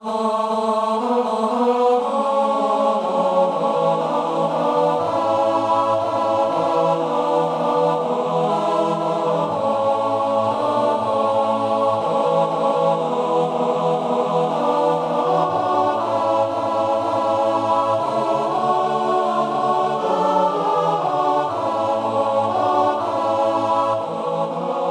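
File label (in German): Männerchor dreistimmig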